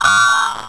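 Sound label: droid probe dead; Star Wars Knights Of The Old Republic I; XBOX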